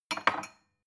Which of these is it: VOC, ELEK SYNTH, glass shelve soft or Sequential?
glass shelve soft